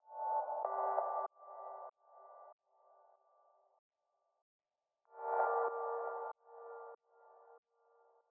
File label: 95 Gm; MOTEL